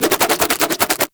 Nunchuck Quick Spin Whoosh; Weapons; Warframe; Infested

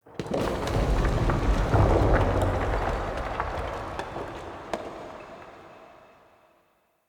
Puzzle Rune Hit Room Shake B; Circade Community

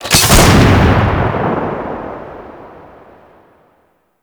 Missile Platform Launch; Warframe; Grineer

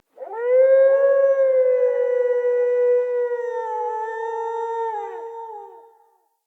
Wolf Cub Trapped Call Distant; Vocals; Animals

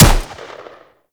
Grineer M16 Rifle Shot; Weapons

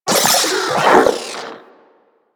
Vocal Death; Warframe; Corpus; Mini Moa

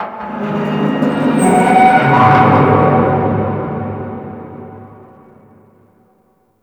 Metal Wronk; Props; Warframe; Tau; Ambience

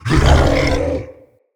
Corrupted Melee Attack Growl; Vocals; Bromius; Animals

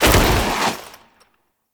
Foley Roll; Warframe; Characters; Kahl

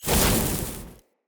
Short Sword Fire Throw; Weapons; Elemental

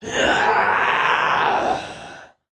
Death; Warframe; Leekter; Battle Barks; en

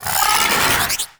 Lightning Stomp Cast; Warframe; The New War; Archon; Boreal; Abilities

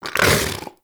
Vocal Tranquillized Grunt; Hunting Critters; Vulpaphyla

